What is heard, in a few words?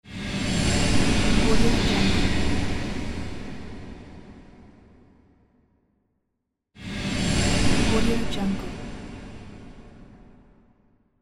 air woosh